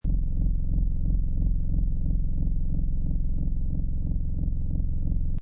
Stable Core Reactor Loop, Low intensity, even pulsating space engine; or machine room